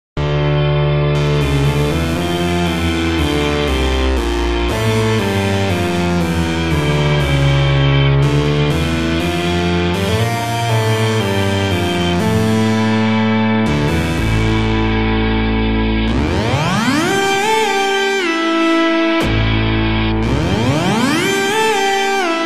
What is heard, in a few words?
solo